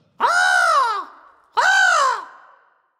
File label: ambience crow; dead or alive 2